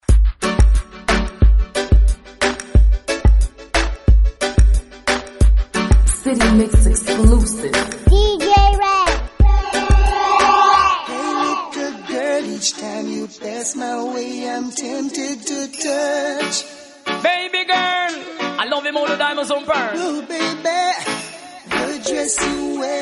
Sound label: reggae